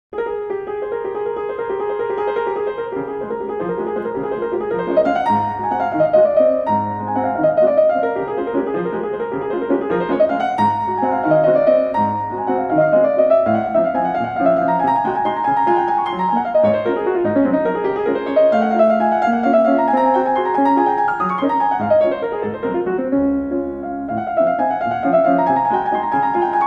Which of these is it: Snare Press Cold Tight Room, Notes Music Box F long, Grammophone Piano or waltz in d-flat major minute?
waltz in d-flat major minute